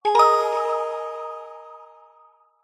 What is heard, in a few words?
multimedia game sound kids warm mellow positive